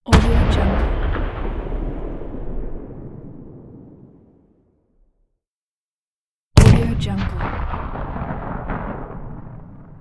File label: tank cannon shot